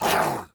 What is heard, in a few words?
Cry; Skunch